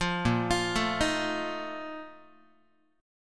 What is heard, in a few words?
Bb Major 7th Suspended 4th, Guitar; BbM7sus4 arpeggiated